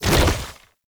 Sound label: Tank Infested Arm Move; Enemies; Movement; Lasrian Tank; Warframe